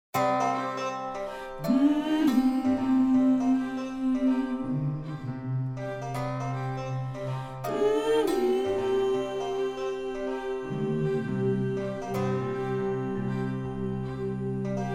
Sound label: Soprano; choir